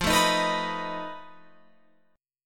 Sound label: F 7th Flat 5th F down Guitar Open F; F7b5; strummed